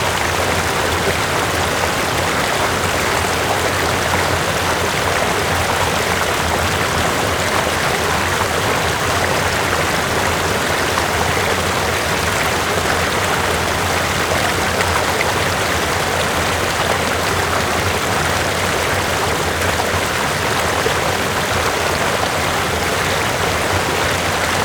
Dam, water, flowing, steady flow, close, rumble; Double Trouble Audio

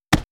Hit; NoizBoy; Hit Kit 1

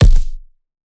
Punching Percussion, Straight Hand, processed

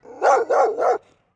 Dog big close barking; Dogs; Bart Kamski; Dogs - game ready

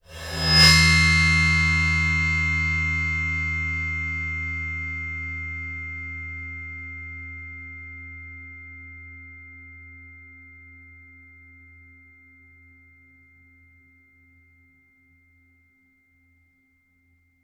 Bowed Cymbal, Highs Mids Lows; 13HH; Soundopolis; Fienup

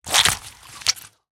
Juicy Crack and Break, Vegetable Massacres; Mechanical Wave

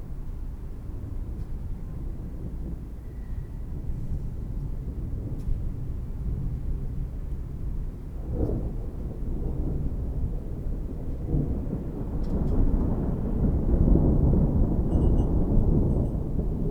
distant thunder; 99Sounds; Rain And Thunder

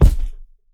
Punching Percussion, Bag Drop, raw; 99Sounds

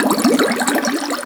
WATER Bubbles; GameBurp; 2000 Game SFX

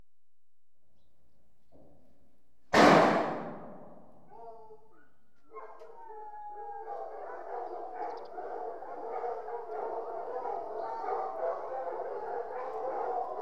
General Atmosphere At Hunt Hennel, Dogs; Horses & Dogs; BBC Sound Effects Library

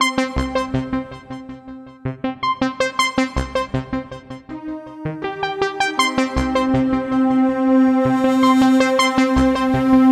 Signature Sound; FXHome; Signature Tracks

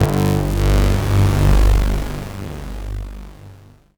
afterlife ambient synth texture; BluezoneCorp; Afterlife - Ethereal Ambient Samples

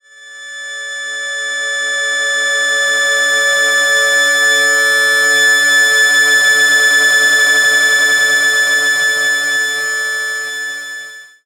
thorium cinematic trailer tension; Bluezone

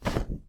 cloth; Kenney's Sound Pack; RPG Audio

